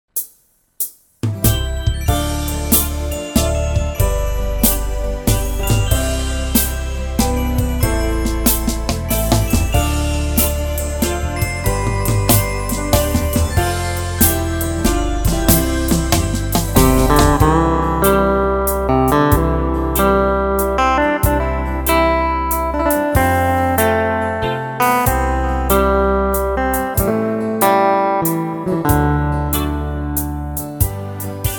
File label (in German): walz